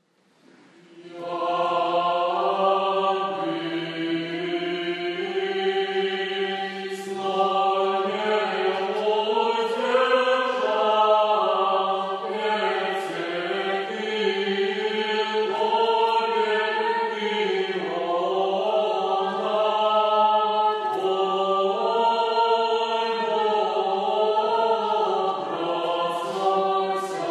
Молодежный хор г. Новосибирска, Ирмос 6 песни канона; Ят бысть

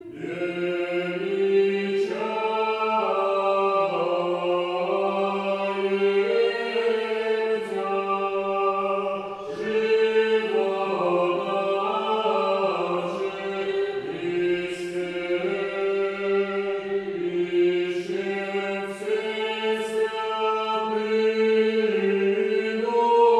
Троице Величание

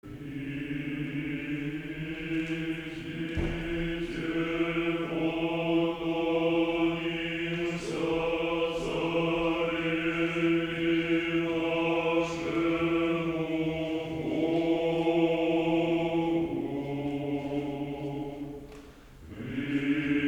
Архиерейский хор Московской митрополии; Выступление в Кишиневе 28.07.15; Приидите поклонимся

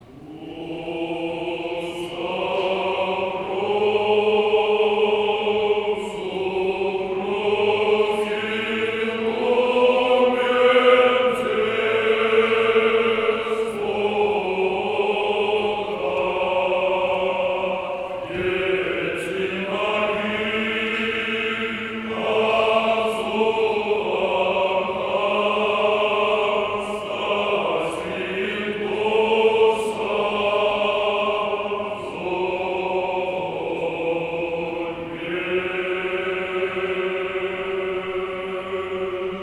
Мужской хор; Рогожский мужской хор; Вечер Духовных Песнопений 2016; Кресту Твоему покалняемся Владыко; по гречески

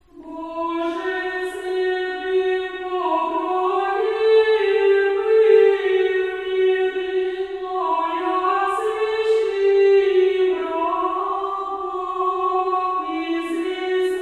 Пятидесятница ирмос 1 песни; Пятидесятница ирмос 1 песни 2016

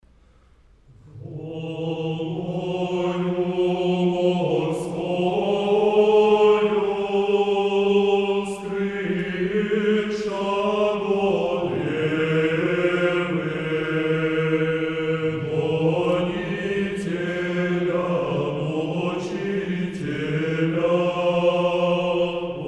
Ирмос песнь 1. Хор певчих Сибири; Волною морскою; Великая Субота

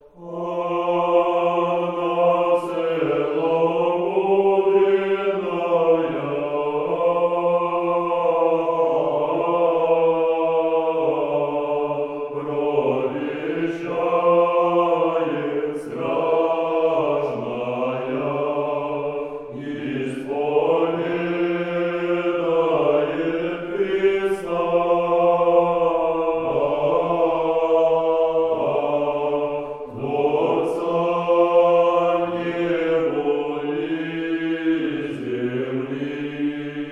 Сретение. 6 припев 9 песни; Анна целомудренная; Казань

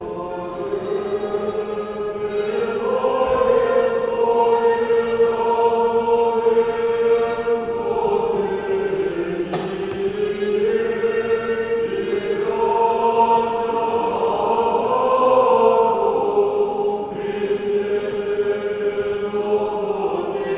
Рогожский хор 5 глас ирмос 6 песни

